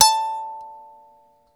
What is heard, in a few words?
thumb piano; Zero-G Ambient 1